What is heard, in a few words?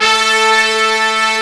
BRS, ROCK